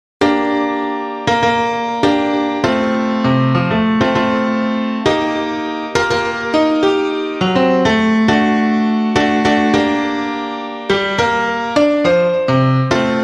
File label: piano